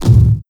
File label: Tom Crispy Dist